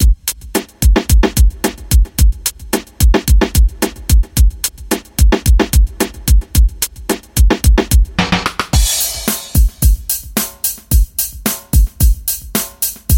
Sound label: Rock Hip Hop 110